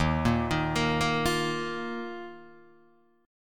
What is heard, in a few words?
Eb Suspended 2nd, Guitar, Open D